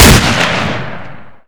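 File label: shotgun double fire; weapons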